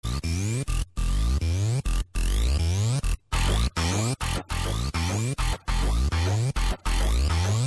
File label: vibration remixed, sms; ringtones